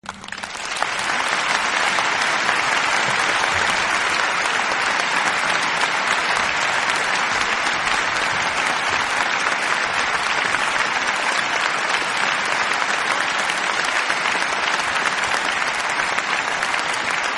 aplausos sonidos de gente aplaudiendo sonidos de personas aplaudiendo applause; applause sound eff